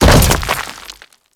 Grineer Land Dirt, Footsteps; Enemies; Warframe

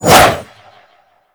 Arch Wing Sword Short Whoosh; Warframe

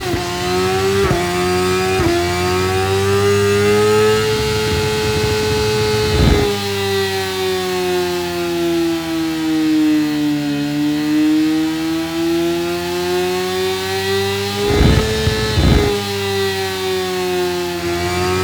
Engine Boost Engage, Motorcycle; Warframe; Vehicles; Motorcycle Ninety Nine

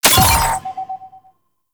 Scan Pillar Burst; Warframe; Frumentarius; Scan Ability

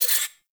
Zoom In, Rifles; Snipetron

